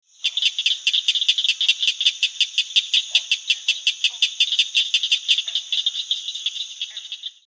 Southern Cricket Frog; herps of NC; anurans

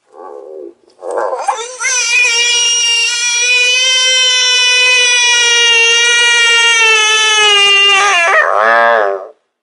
Bullfrog being eaten by watersnake; herps of NC; anurans

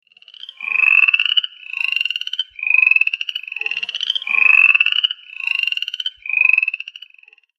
Upland Chorus Frog; herps of NC; anurans

